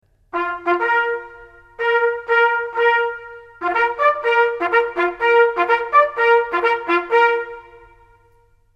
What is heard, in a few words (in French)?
Appel des malades, Sonneries reglementaires